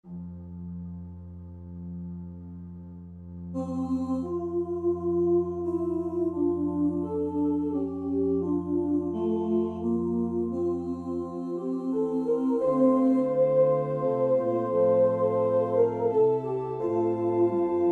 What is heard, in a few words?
Amazing Grace Intro Descant Coda